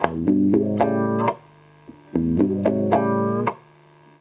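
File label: GTR left, Roots Disco; USB Soundscan vol.32; AKAI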